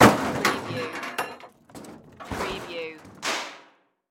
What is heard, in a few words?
Crash Metal Debris; settle; Sound Effects Bible